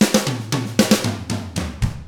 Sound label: ROCK